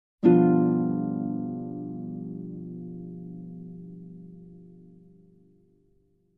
Soft dominant seventh harp chord

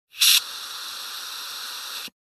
Tire Pressure Hiss

